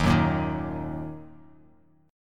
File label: Eb Minor Bass Standard; Ebm strummed; JGuitar